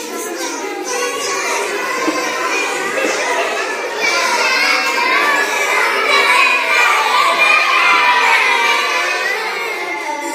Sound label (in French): guitare